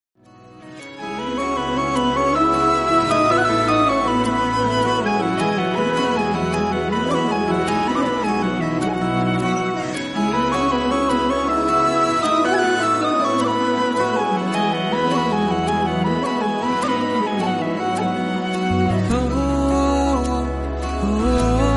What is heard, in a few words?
Climax Bgm Ringtone; Oh My Kadavule